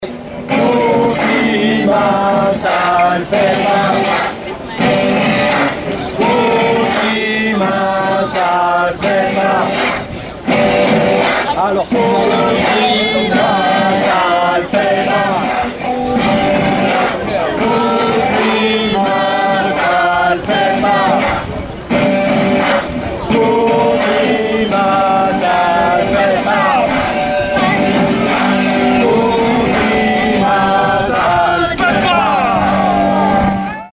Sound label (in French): sonnerie; sonnerie pour le climat